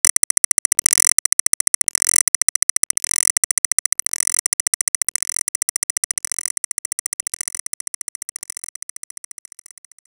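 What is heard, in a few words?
Common Gauge Up Loop; Level Gauge Up Loop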